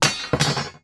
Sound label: imact metal steel strips drop on wooden floor